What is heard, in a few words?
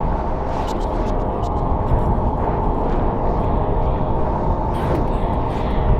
wind leap; nexus tower